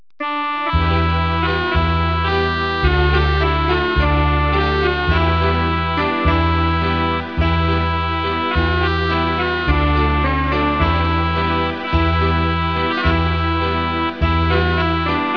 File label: Instrumental